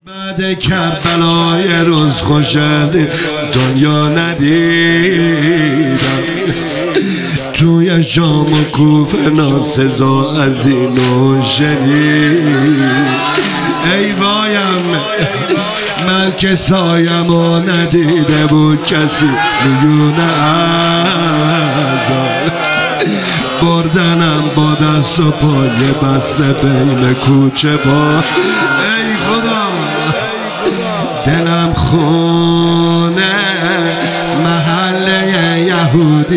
مداحی

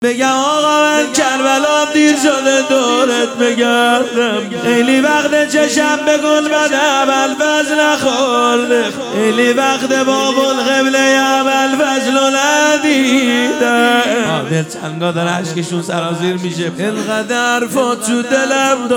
روضه

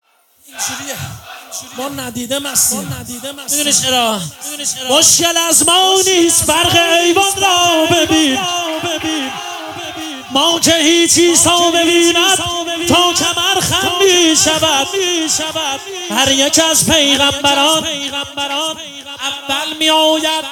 مداحی

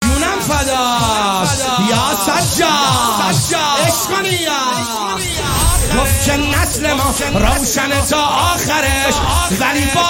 مداحی

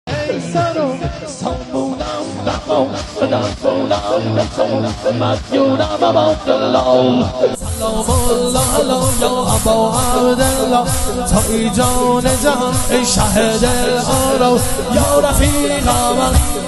مداحی شور

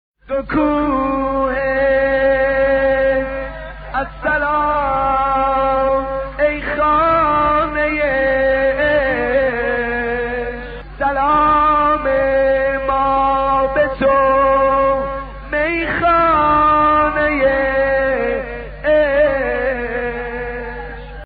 مداحی; دوکوهه

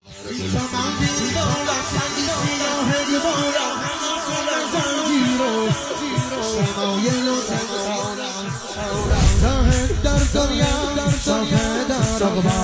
مداحی; شور

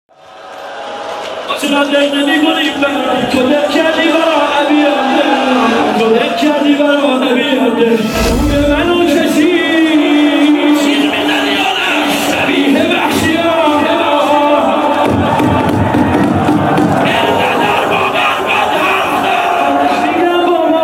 روضه

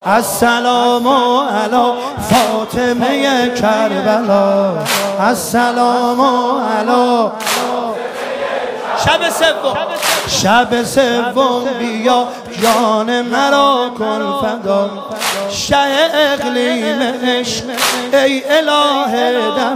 مداحی

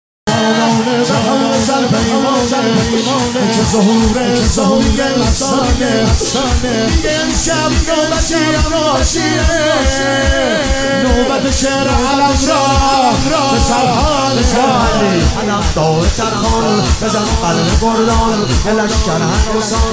مولودی

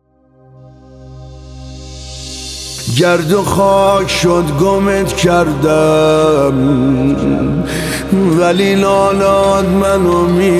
نماهنگ; قتلگاه

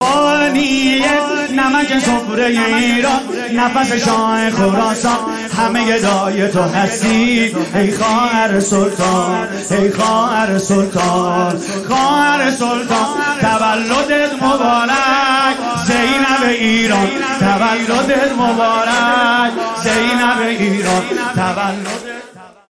مولودی

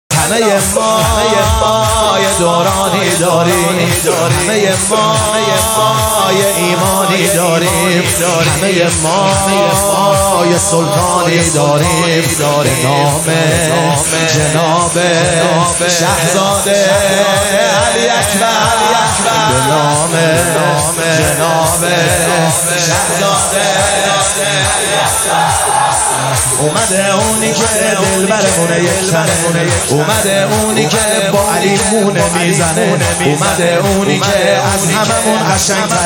مولودی

